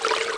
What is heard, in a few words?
SLOSH; NOAH DEMO